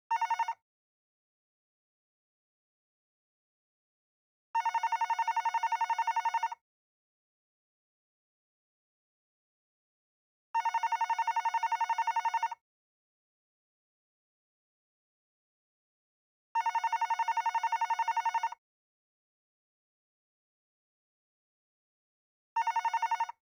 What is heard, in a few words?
Hotel Phone